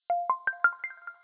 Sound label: alarm; fldigi; alert clips